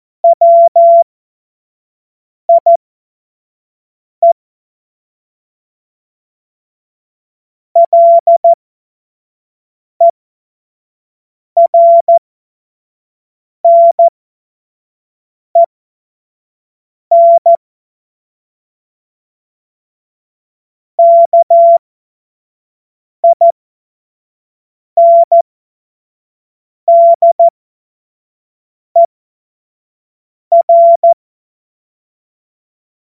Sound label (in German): Witz, cw morsen; Morsekurs